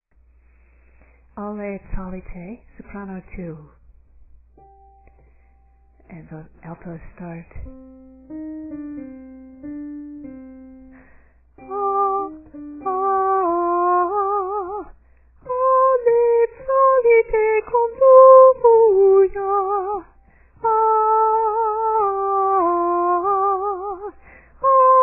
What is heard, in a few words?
Sop 2; Alle Psallite